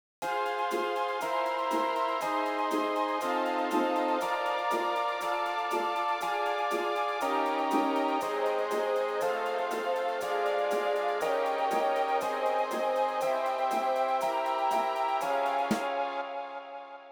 mellotron, 120BPM

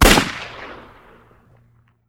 Revolver; Weapons

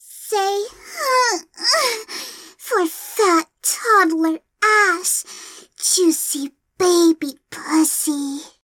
HNNGGHHH

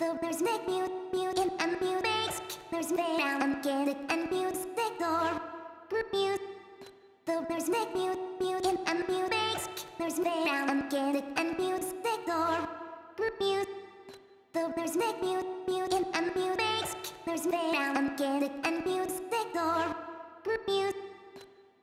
vocal splice, 130BPM; stems; Pony Fan Music Remix Stems

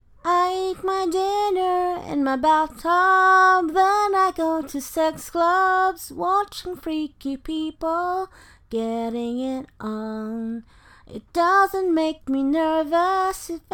F4A, Cover, Rainy Night Version, A Cappella

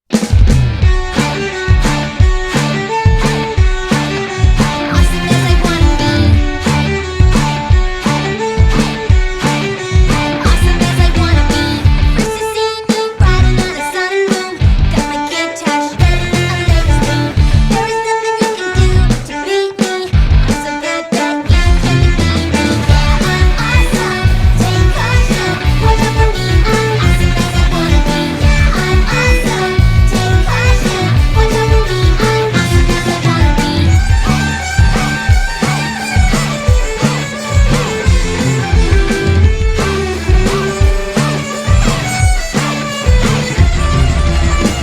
Drum Bass Violin Cover

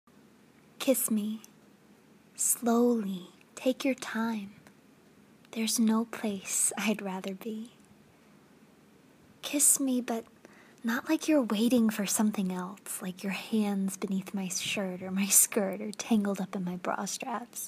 Kiss Me, asmr kissing

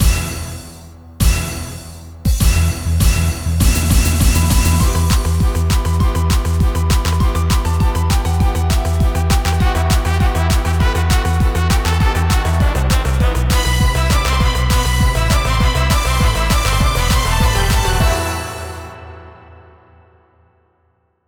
Trailer Theme; The Showoffs Present Criminal Sound- The Music of Canterlot Siege 4

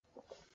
Swallow; Lewd SFX

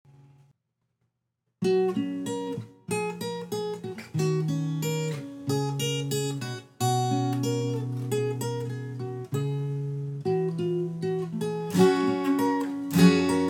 Acoustic cover

